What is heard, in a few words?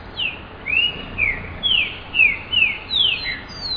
thrush sound